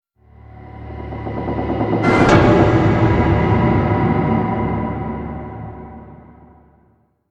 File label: Stinger Swell 5 Seconds